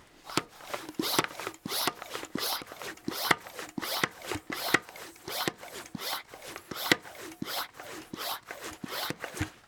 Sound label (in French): BRUIT Pompage